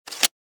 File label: Technology Floppy Disc Insert; Computers; Electronics; SFX Bible